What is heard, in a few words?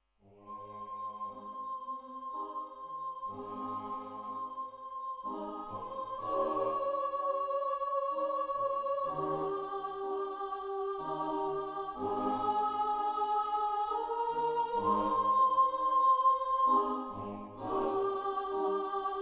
Choir